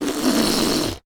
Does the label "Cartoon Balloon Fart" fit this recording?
Yes